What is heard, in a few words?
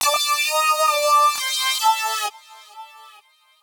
132bpm Lines n Loops Synths, uk garage samples